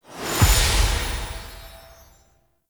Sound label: NPC Interaction Open, UI; Entrati